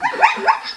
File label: zebra die; Caesar III